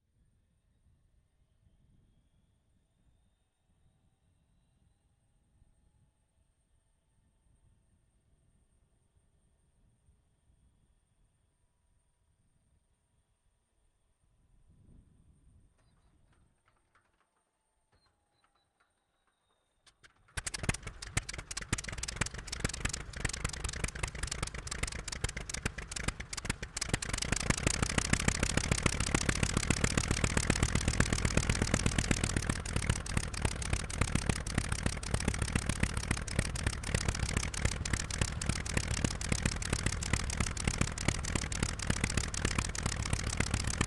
Mitsubishi Zero start idle stationary on ground revs off exhaust right; dpa4062; Sonniss GDC